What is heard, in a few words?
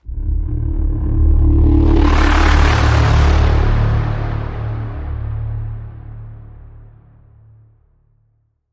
Bend